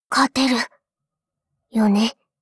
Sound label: battle warcry